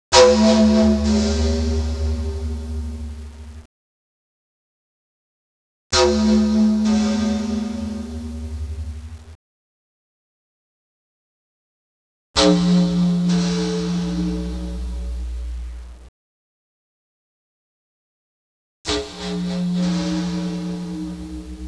musica strumentale

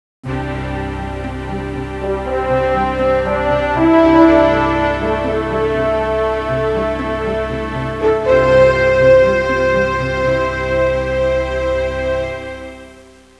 musica sinfonica; symphonic

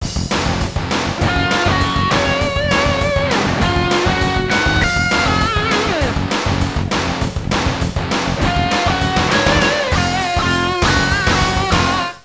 suonerie musica rock

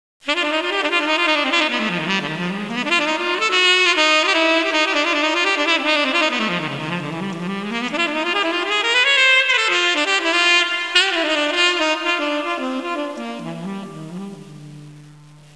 suonerie musica jazz

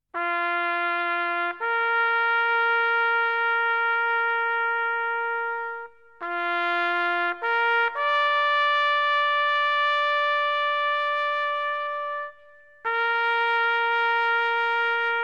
suonerie tromba militare; armi

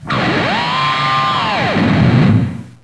suonerie musica rock

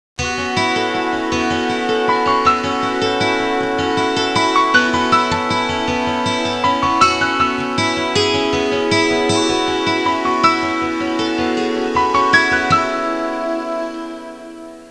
suonerie musica hawaiian

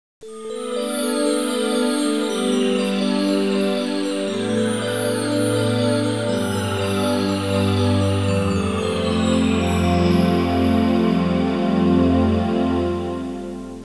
audio suonerie musica mistica

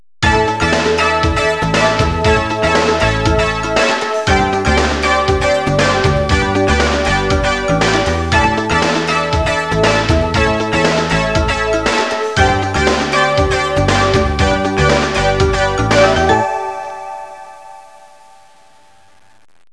suonerie businessy

